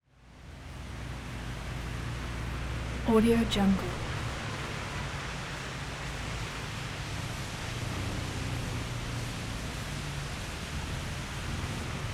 wet traffic tire on wet floor

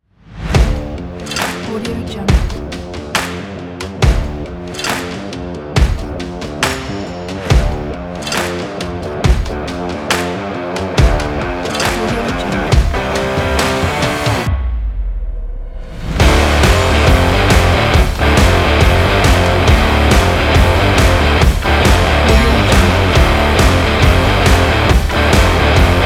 hard punk

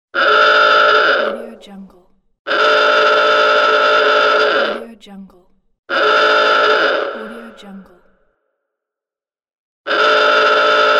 buzzer

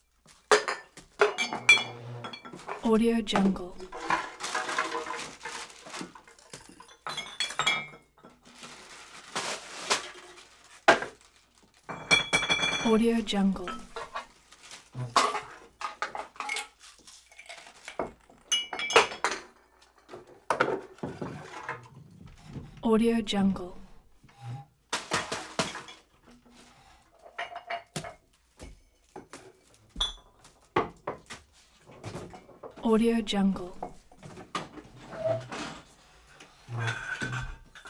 kitchen rummage

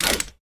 settlement ui repair wrench construction tool; empire game; tinker

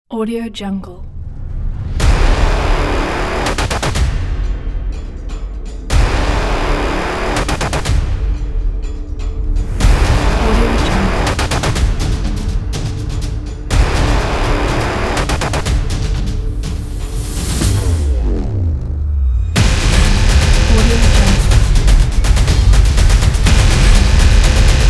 the tribal drums

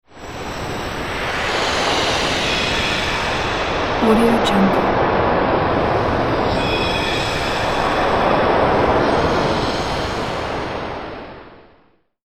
panning surf whoosh with shimmer; sound-ideas

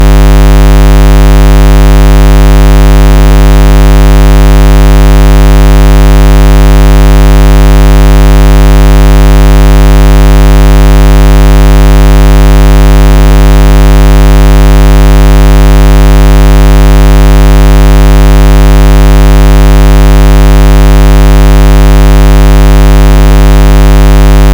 test tone square wave; prosoundfx

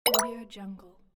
button pop

